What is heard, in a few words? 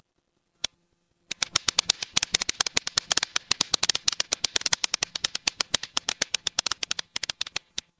fire electric arc